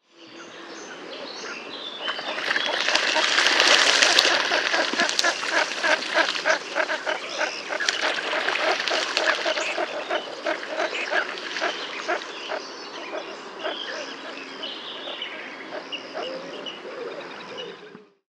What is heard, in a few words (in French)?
Nette rousse Netta rufina; Natura 2000